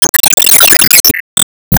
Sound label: Enemy Gear Fall